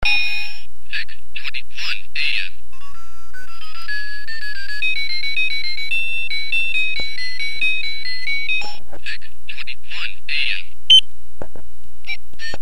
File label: watch